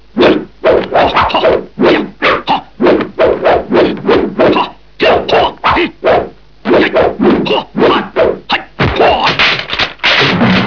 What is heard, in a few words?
Fight; 特酷声音素材库